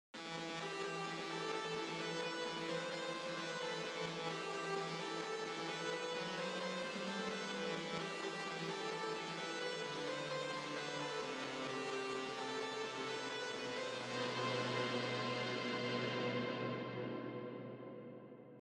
Reverb

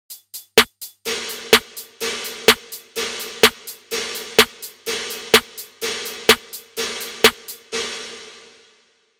Snare

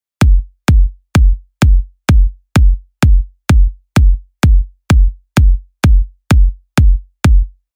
Kick

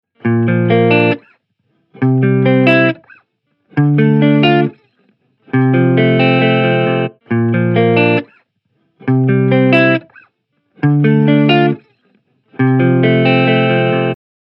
Guitar Dry

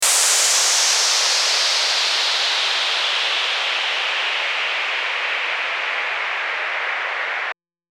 White Noise

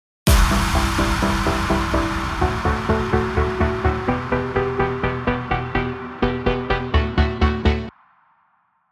break chords low high cut

